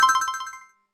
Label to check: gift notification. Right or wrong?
Right